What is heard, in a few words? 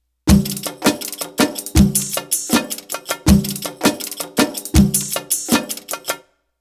Arabic Rhythms